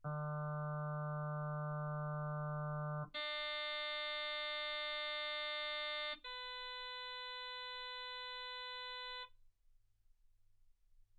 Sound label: harm sinus 48kHz